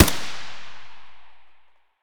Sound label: ak74u muzzle mono distant, weapons; STALKER; IWP